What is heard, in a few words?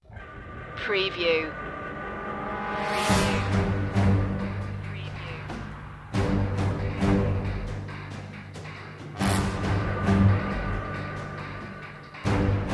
no choir